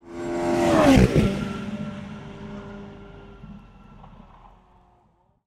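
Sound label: ferrari 430 challenge race car onboard fast; holophone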